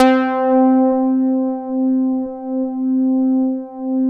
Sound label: MATRIX 12, OB Synths; E-MU Formula 4000 Series Vol. 3; Analog Odyssey